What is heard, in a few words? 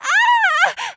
scream; spiritheroes; Ada Final